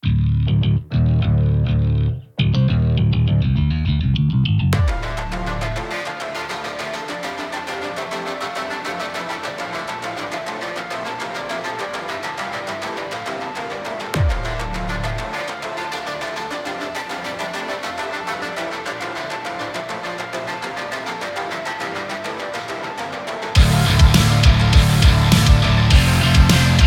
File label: Solo